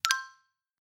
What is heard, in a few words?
voip on allow talk; tweb-i2p